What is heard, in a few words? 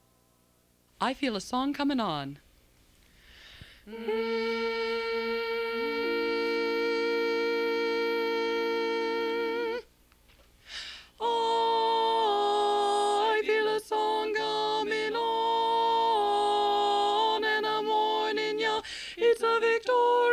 Tenor; region17online